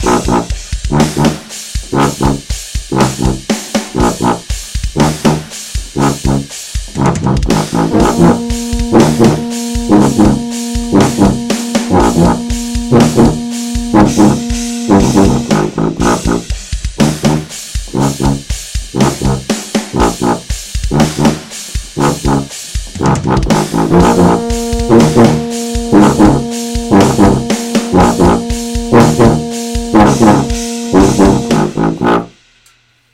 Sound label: riff